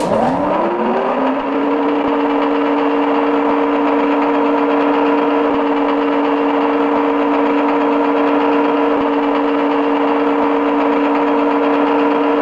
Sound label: fork up down, forklift; garrysmod; sw forklift truck; sligwolf